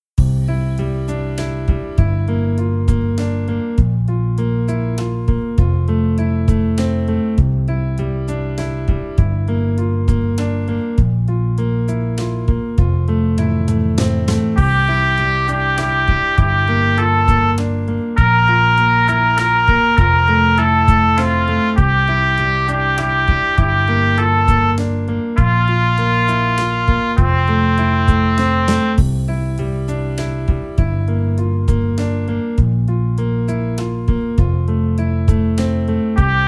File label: Trumpet; easy beat vol 1 trompete